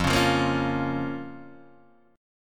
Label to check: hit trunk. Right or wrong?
Wrong